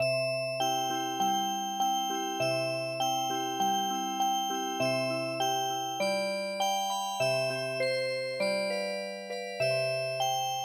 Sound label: wooden soldier Music Box